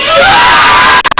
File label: Dreamer Scream